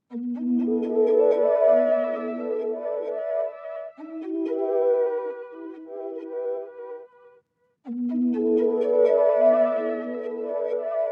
124 BPM E Min Counter Melody; Cymatics; 2022 Melody USB Expansion; Heaven

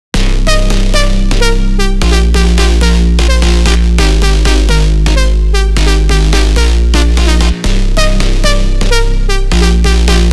128 BPM C Min, House, Drop Loops; Golden Hour; Cymatics

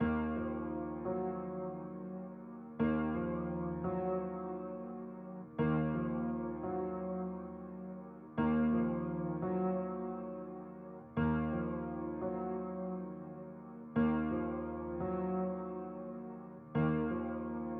Simple Melody Loop - 86 BPM D# Min Keys; Cymatics; Oracle Sample Pack

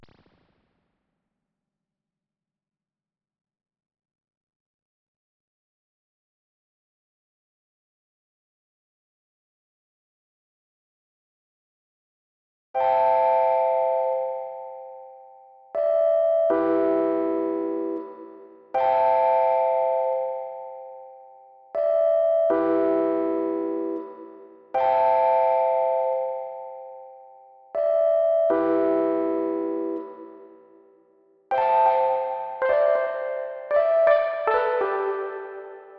80 BPM C Maj Keys; Loop Stems; Cymatics; People Change

